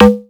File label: E-Perc Donk, Electronic Percussion, Drums; Ableton Live 12 Lite; Core Library